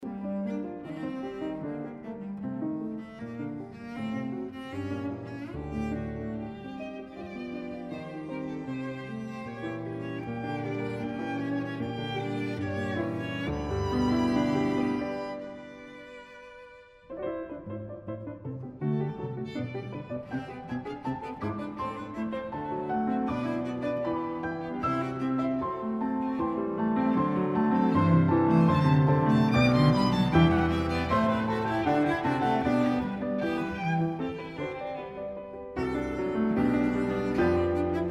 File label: piano trio